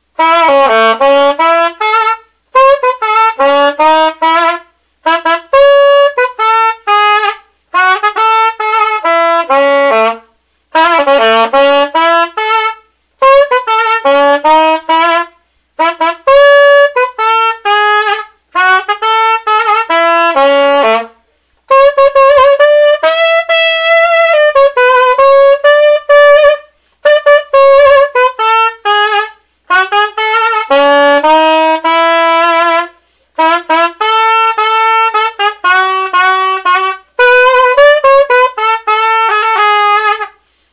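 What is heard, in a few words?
Conn Soprano saxophone straight, key B flat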